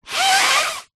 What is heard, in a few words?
human blow nose into tissue paper short